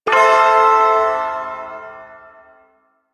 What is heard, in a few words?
Shawzin Pent Maj Chord; Warframe; Duviri Ersatz Shawzin